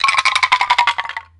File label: Bone Tower Percussion Rattle